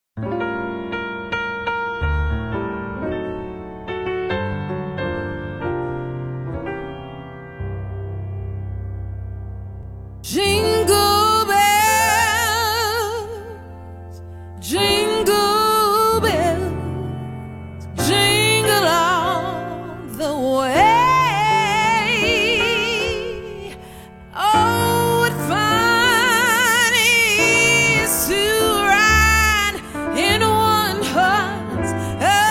Jingle Bells Prelude

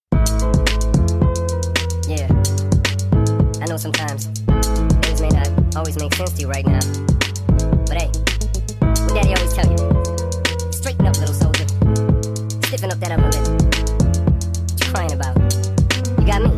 Sped Up Version

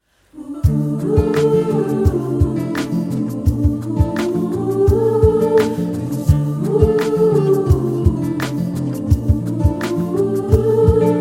Interlude, Choir

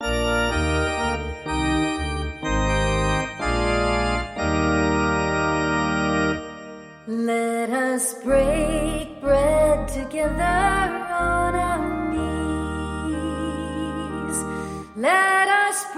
Christian Hymn; Let Us Break Bread Together

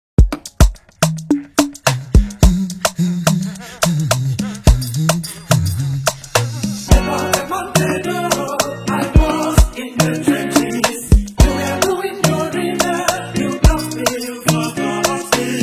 Choir

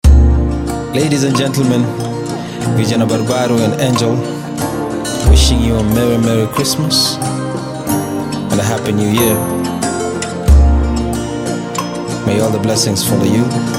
Jingle Bells

